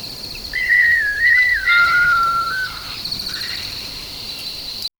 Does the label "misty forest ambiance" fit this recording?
Yes